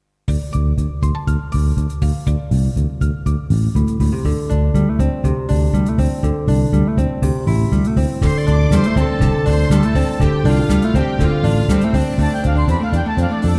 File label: city birds, Ontwaken met het geluid van vogels in een stadspark.; AG fusion